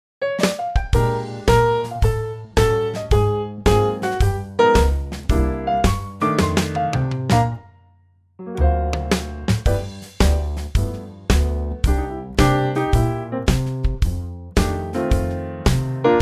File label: Trio Bb; key - Bb - vocal range - C to Eb